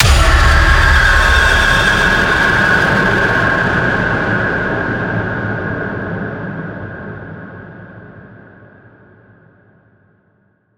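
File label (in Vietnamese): Tiếng bùm kéo dài vang vọng kinh dị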